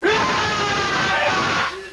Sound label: scream